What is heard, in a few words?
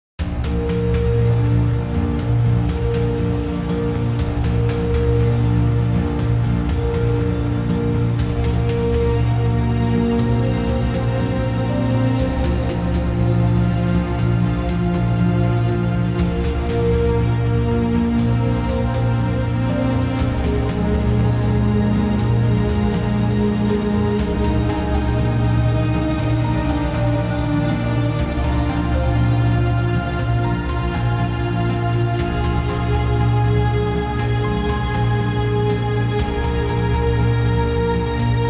Scifi Theme Royalty Free Theme Music